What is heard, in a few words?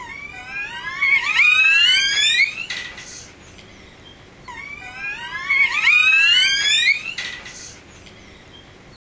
long calls